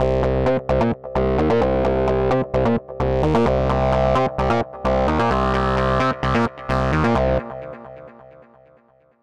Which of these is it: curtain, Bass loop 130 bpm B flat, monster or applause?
Bass loop 130 bpm B flat